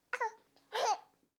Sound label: baby laughing; human sounds; خنده ی بچه